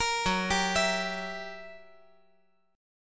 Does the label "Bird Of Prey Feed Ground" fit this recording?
No